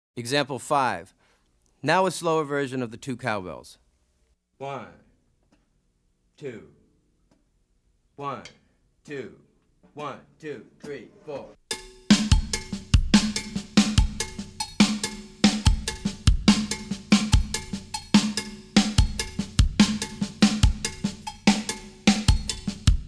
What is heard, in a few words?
Afro-Cuban Grooves For Bass And Drums